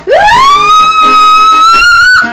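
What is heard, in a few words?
ambulance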